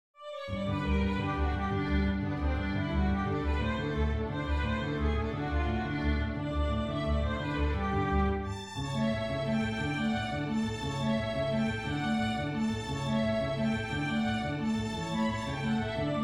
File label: jarabe full